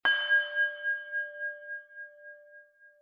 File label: tone; organic